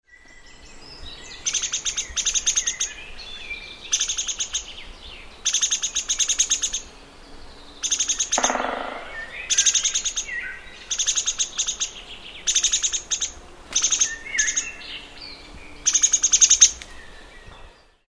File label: three toed woodpecker